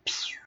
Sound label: laser pew